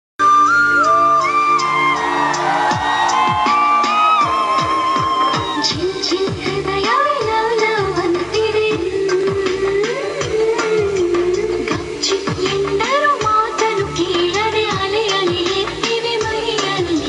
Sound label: Telugu Ringtone; ringtones